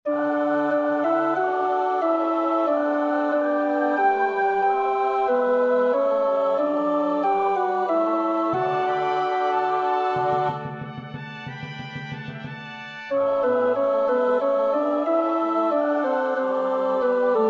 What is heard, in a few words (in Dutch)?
tenor, koorpartij oefening; Bach (Johann Sebastiaan); BWV 171